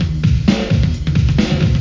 breakbeat; beats

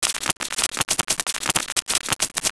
roach nearby; Army Men TiS

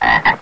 Sound frogs; POND

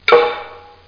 cli thunk

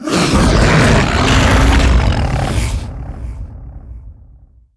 typhon hit; DAoC

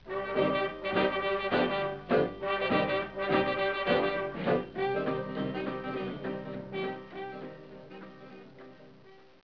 STARTUP MUSIC

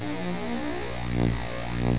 buzz

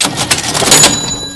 Ch Ching; RivalBall for Macintosh

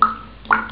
water

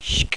shhhiiick

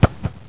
KICK HARD; SOCDEMO

